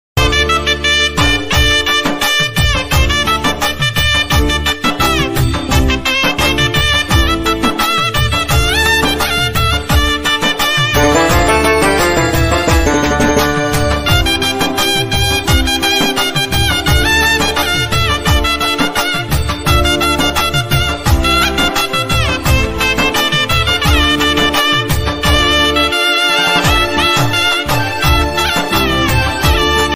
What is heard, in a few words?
ayyappa swamy instrumental ringtone; lord ayyappa swami